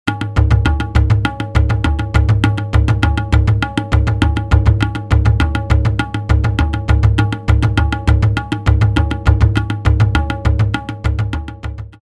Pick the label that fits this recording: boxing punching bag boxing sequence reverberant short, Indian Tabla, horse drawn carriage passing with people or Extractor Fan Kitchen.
Indian Tabla